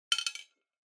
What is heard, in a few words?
Drawing Machine Garapon, Drop